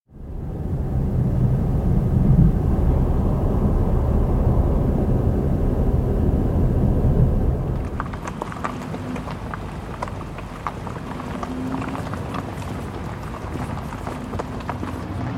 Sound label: Transport; Transport SFX Series